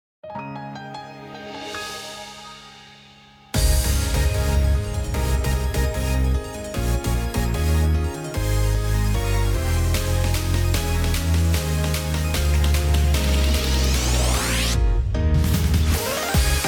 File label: inst